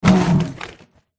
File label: tree wound